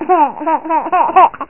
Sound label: Giggle; Bangin' Thing